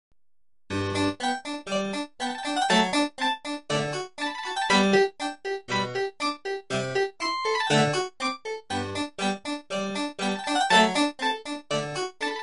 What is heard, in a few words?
magic flute; Phantasmagoria